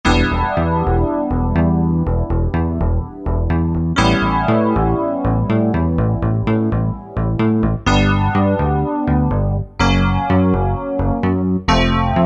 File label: FM Jazz Split, E-mu PK-6